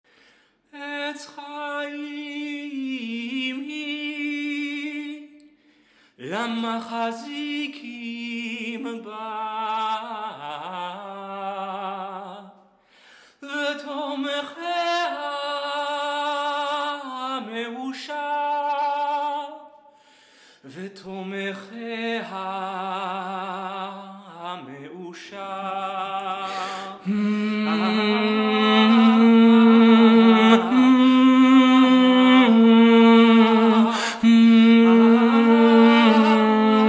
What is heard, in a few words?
Etz chayim tenor